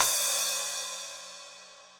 Splash 12 Low Loud Squashed, Cymbal; Drums; Drum Hits; Standard Hits; Ableton; Factory Packs; Session Drums Club

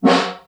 Far, Snare; Ableton Foundation FreePacks; Snare Designer

